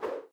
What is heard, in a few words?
walljump